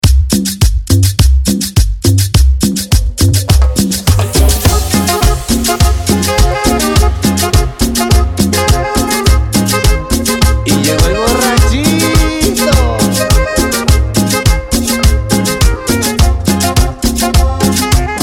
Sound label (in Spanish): Intro Cumbia Trap, BPM 104